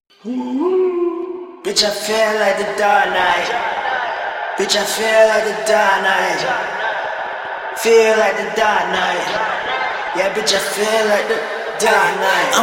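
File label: hip hop, 82, A major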